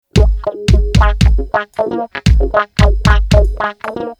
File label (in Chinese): 动感音乐